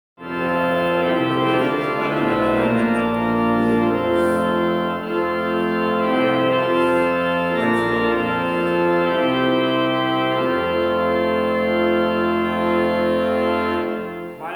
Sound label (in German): Orgel; Die Orgel in der Schlosskirche wurde renoviert!; Salder